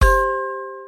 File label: count up end; Beauty Warrior